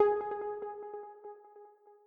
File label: EPiano